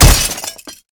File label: glass; Rust